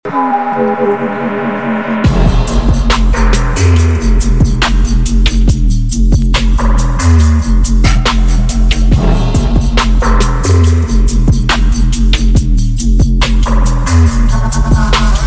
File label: Dub